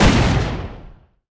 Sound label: gun Hit